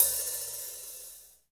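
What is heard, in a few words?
hi hat; HH-Kits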